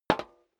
step high heels metal dry single right; menschen; schritte

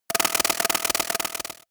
computer sound, signale; soundpool

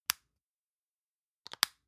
lotion lid close medium; berufe

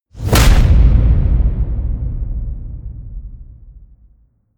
wham, whooshes

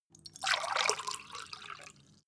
glass pour fast; soundpool

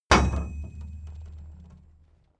metal tool drop massive; soundpool

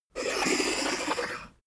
poker chip slide table long

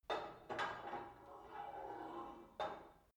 put light weight onto barbell soft, fitnessstudio; sport freizeit

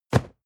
cardboard box medium place hard; objekte

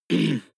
harrumph male single short; soundpool

